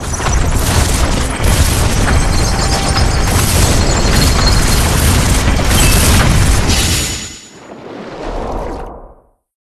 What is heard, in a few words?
Jungle Boss End; jett rocket; wiiware wii